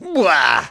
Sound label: Aaa Bah